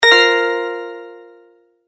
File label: multimedia tone correct; McKinney Sound